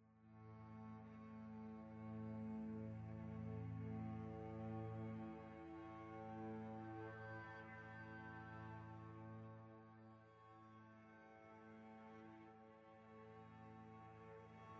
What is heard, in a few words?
original score